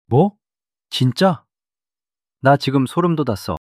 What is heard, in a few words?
surprise